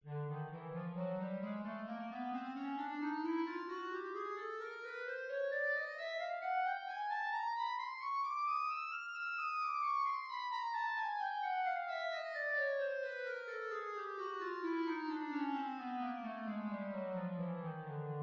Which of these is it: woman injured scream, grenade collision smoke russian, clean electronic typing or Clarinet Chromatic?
Clarinet Chromatic